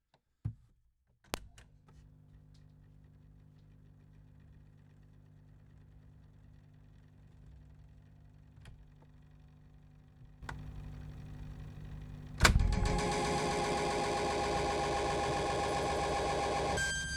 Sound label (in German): Echo Mode (Lautsprecher Tonband), Grundig TK 747; Komposition; soundcheck